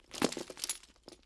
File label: Commando Belt Rattle; Foley; Commando walking elements